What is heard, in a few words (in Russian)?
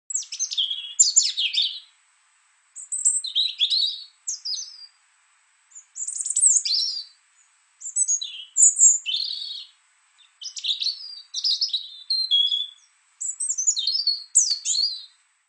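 Zaryanka, robin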